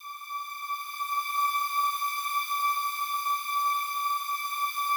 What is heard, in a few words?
slow strings D5, Yamaha VP-1; 90 s Sample CDs; Music Store